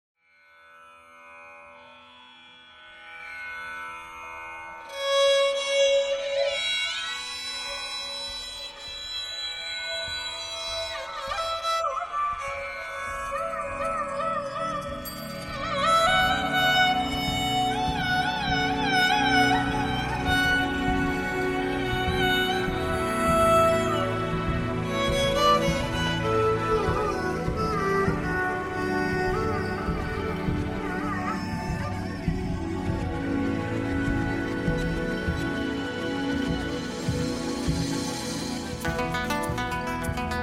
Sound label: Vocal Removed Karaoke